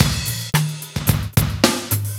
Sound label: Rock Tweak, Funk Beats; Sonic Foundry (Sony Creative Software); Synchro Funk